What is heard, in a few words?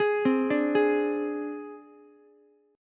C Suspended 2nd Sharp 5th, C, Ukulele; arpeggiated; 13,12,10,11; JGuitar